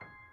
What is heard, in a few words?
piano high